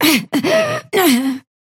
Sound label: pain death